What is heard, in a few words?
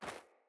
Sandals Run Snow, Footsteps; Player